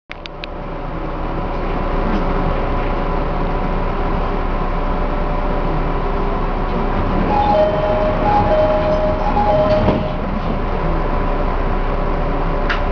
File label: e501, train motor sound; uchihara-akatsuka